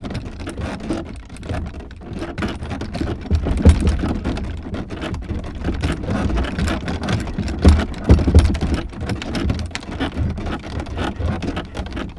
Carriage Rolling, Wood Groan, Bumping, Bridle Jingle, Close POV; Universal SFX